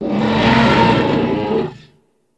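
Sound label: Dinosaur Roar Short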